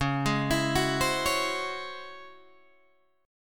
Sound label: C sharp Minor Major 11th, Guitar Open G; 11,13,14,11,13,11